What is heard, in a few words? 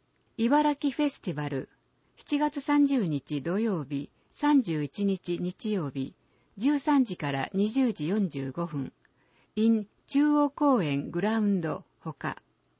festibal